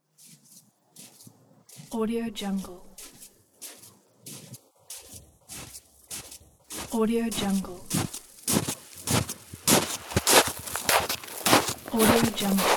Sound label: passing footsteps on snow